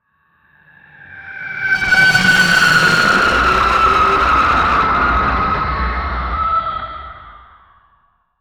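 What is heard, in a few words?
alien otherworldly scream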